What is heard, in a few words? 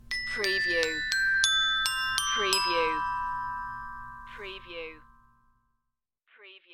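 Xylophone Melody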